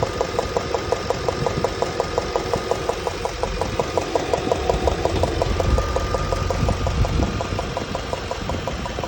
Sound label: clicking sound after oil change; XJ XJ8 XJR X308